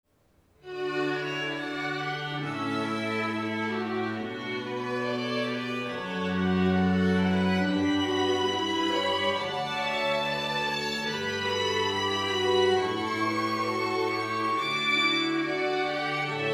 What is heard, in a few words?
canon d string